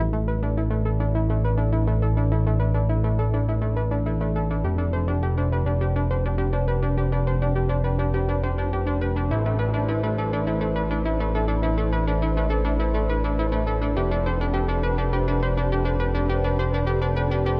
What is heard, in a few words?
Electronic Impulse